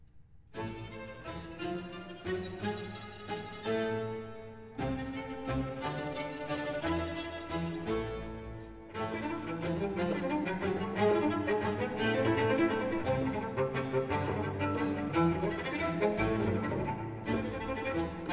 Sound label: cello, strings & continuo; Cello Concerto; A minor; RV 419; Allegro; Vivaldi; Cello Concertos